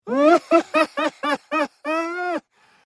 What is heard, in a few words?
doctors laugh